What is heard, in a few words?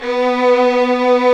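strings, 8pc, vibrato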